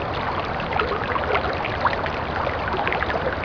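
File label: ambience water pipe loop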